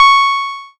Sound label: C#, Synclav FM PLUCKED; Big Fish Audio